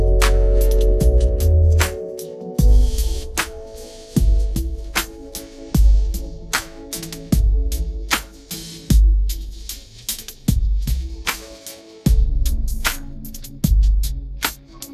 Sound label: lo-fi hip hop mellow piano